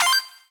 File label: Multi Room In